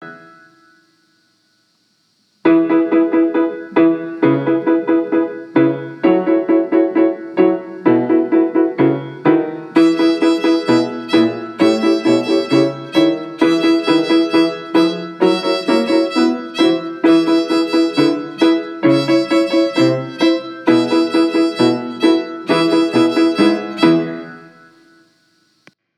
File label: E String Concerto